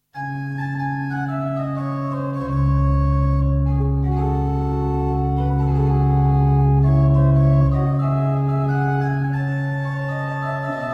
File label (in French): Fonds d'orgue; Boyvin; 1er ton